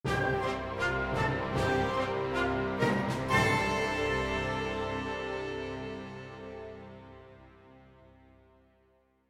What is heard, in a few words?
Music Game Over; asterix obelix slap them all 2